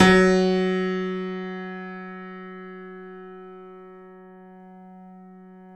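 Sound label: keys, YC7 piano ff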